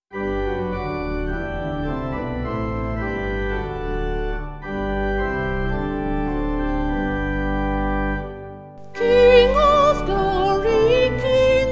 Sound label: Vocal Organ; King Of Glory; Gwalchmai